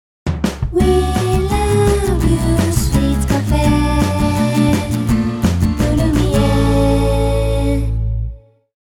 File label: logo